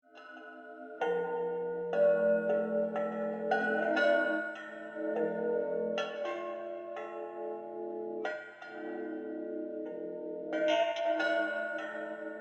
Corrupted Vision Bell; Cinematics; Bromius

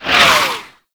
Heavy Orbital Strike Whiz By; Abilities; Warframe; Tau; Dax Heavy

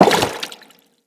Crewman Step Water, Footsteps; Corpus

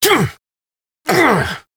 parry finisher vocals; Enemies; Drifter; Warframe; Duviri; Dax

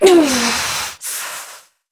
Pain Long; New War

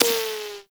Bullet Impact Ricochet; Warframe

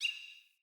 Bird Single Chirp; Josalfar Bird

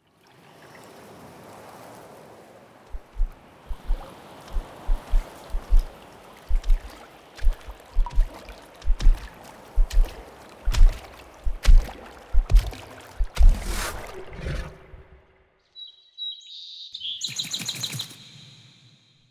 Stinger; Garren

